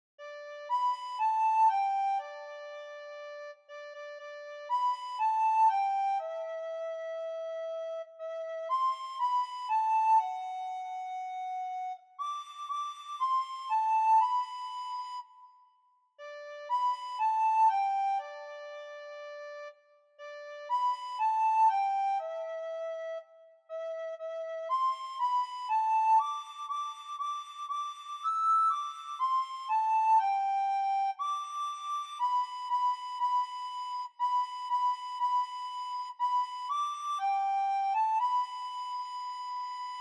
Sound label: Jingle Bells Bfl solo